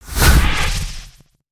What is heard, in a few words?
Dummy Spawn; Valiant Samurai